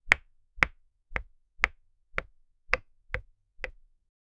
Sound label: three quick taps wooden, percussion